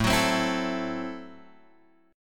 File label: A Minor 6th A Guitar Drop D